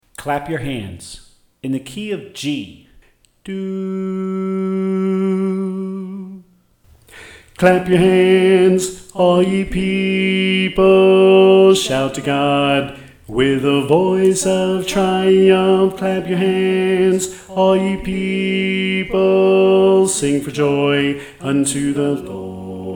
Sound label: Bass